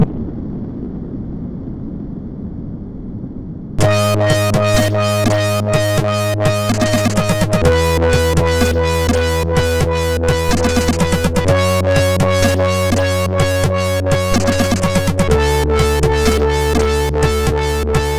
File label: sid MOS6581R2; Ossi Intro